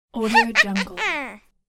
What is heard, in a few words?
kid pretended tricky laughter